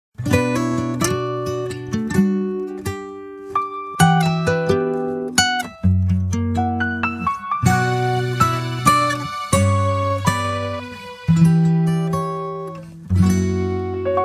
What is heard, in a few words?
instrumental